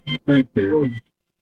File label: ui low battery